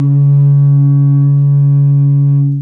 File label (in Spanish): guaje; bonampak